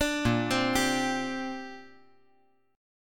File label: Bb 6th Add 9th Bb; Bb6add9 arpeggiated